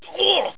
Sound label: trooper pain